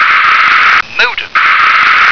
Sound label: modem